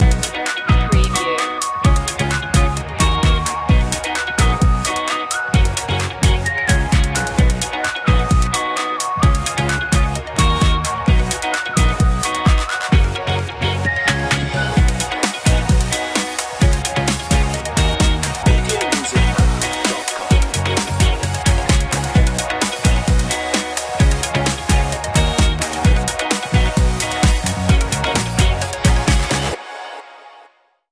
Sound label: happy feel